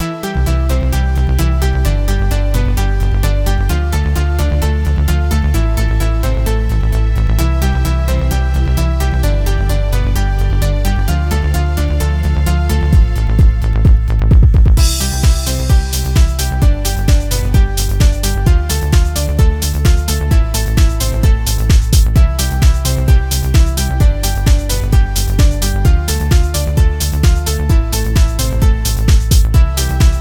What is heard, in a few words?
Dance and Jump